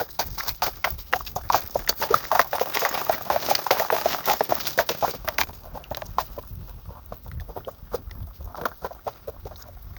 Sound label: the sound of hooves of, hooves